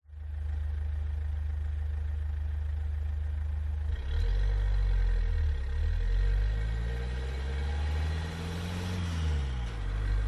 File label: Car Citroen C2 Idle Drive EXHAUST; DPA4062; PMSFX